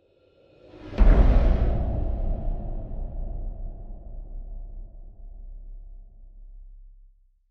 Trailer Whoosh Deep Impact, Whoosh Deep Impact Horror Airy Breathy; Evil Scary Ominous; Trailers (Sound Effects:Sound Design)